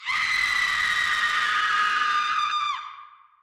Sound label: Mac scream